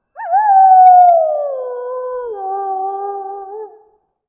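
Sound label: wolf distant; ModernWarfare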